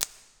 substation oneshot; ambient; Counter Strike Model Pack; CS:GO; nuke

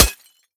glass, bullet impacts; Half Life Model Pack

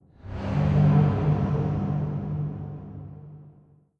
vox wet, strider, combat, idle; creatures

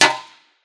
bullet hit shield; physics

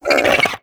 run grunt, headcrab reviver; creatures; vox

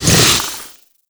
grunt soft, headcrab black; creatures; vox

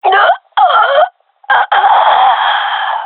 death, gendarmerie fem

